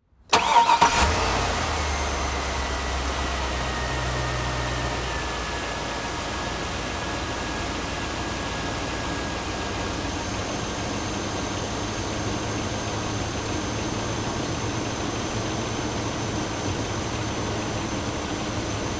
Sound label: Auto Avalanche Start Idle And Shut Off Hood Open; Transportation